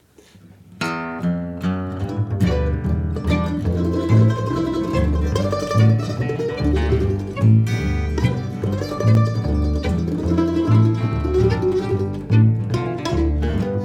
Rain And Snow G

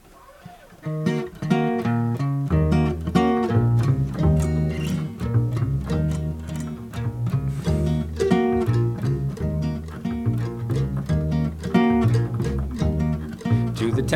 Jazz Vocals

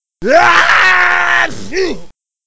sneeze

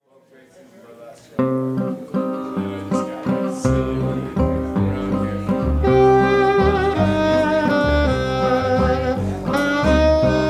WALTZ

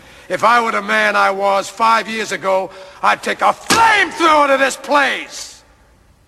FLAME THROWER

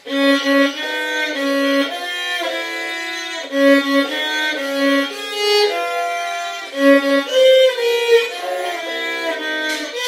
HAPPY BAB VIOLIN

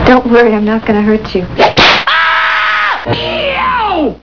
whip yell

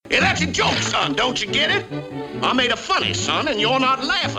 LAUGHING

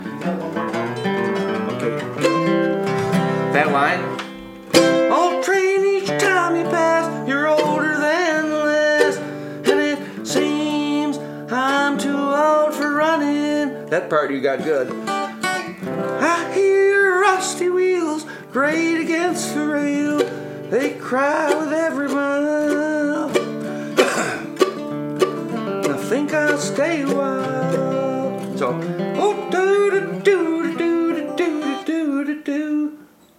OLD TRAIN VOCAL

